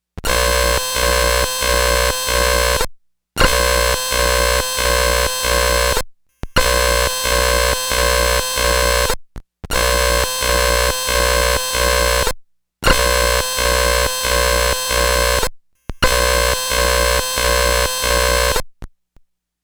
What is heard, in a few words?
Error